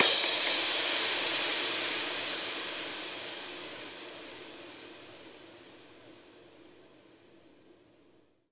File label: Crash, Cymbals